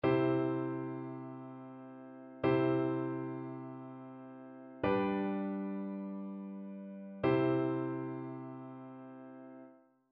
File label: harmonic dictation; aural skills i